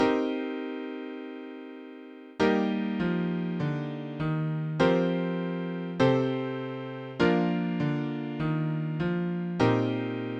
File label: gold piano version; warm pad, with a soft orchestral feel